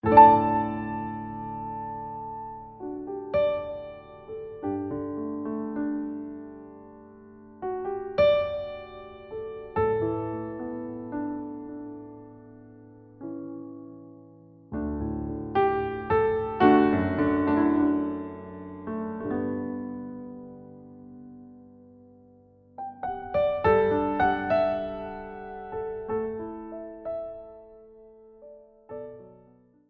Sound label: Piano